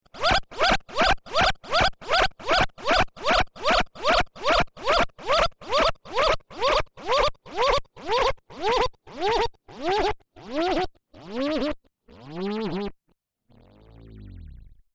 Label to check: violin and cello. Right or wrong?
Wrong